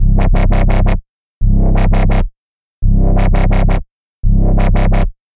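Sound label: Arena Swell, drum bass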